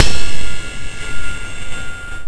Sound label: Ride Bell, F4; Guitar Rock Tour